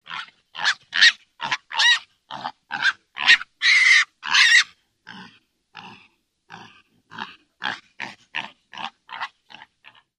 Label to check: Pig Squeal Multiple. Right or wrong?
Right